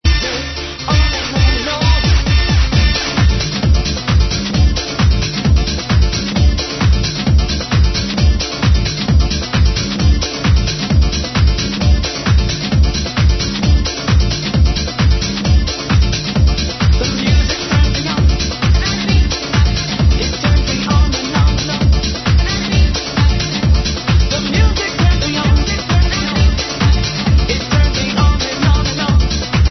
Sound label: latino; bass bumpers